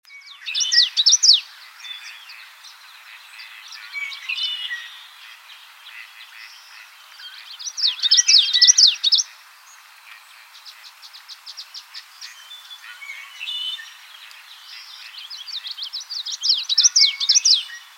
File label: Common Yellowthroat, Geothlypis trichas; warblers